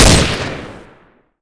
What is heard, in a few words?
machinegun; slender; patriarch